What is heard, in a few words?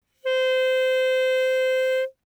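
Eb Clarinet fortissimo C5 stereo; Woodwinds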